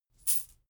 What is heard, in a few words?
chain mail armor movement